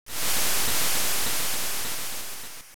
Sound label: Shore; LOZ